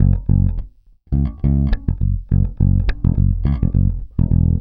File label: Pop, Bass